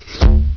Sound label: arrow, weapons